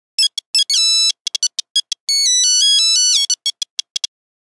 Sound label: Nokia Buzzer; Windows Phone apps; 8310 Jitters